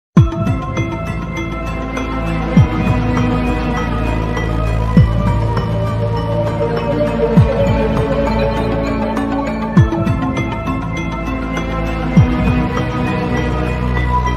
Ghost House; SMM; SMB3

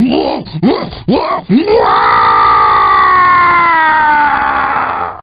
scream; Wizpig

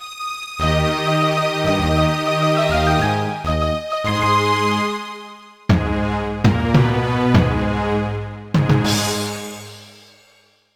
Coaster Hills Boss Victory

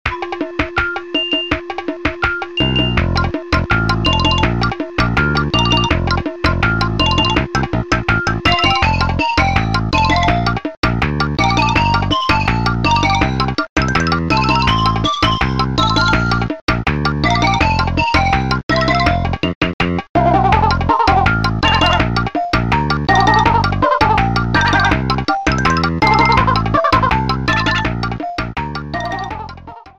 Conga; DKC2; GBA; Cranky's

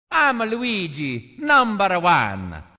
Luigi Win

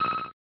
Bonus Coin

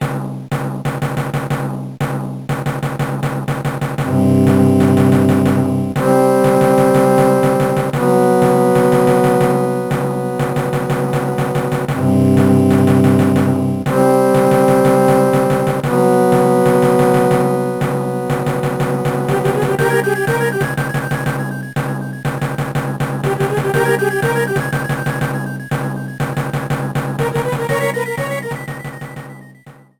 Opening Airship; SMA4

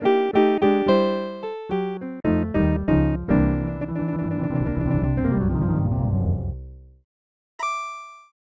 Game Over; SMW2YI

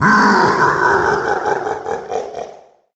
Bowser Laugh; SMG

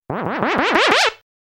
Cape Feather Power Up Theme; SMW

